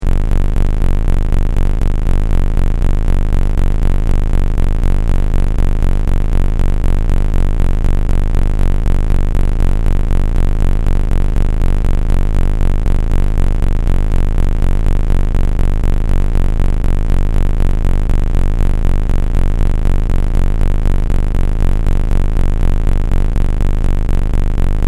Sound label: Noise